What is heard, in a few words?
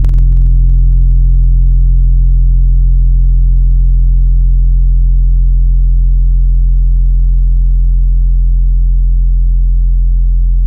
Keys Rhodes Bright; Synthetic's Serum Collection; ESW X Keys